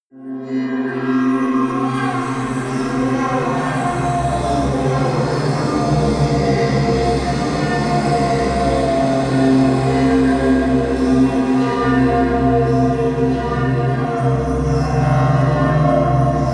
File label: drone hell swirl